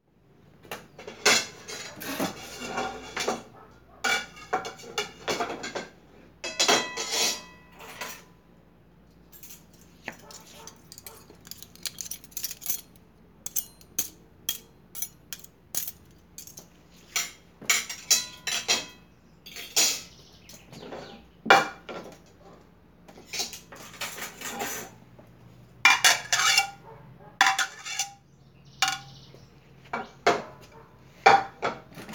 Sonidos Cocina Ambiente Cocina

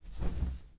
Llama Encendiendo

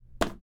Salto En Madera

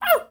Guau perrito feliz

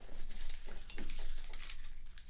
Corte

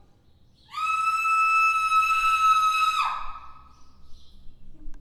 Grito

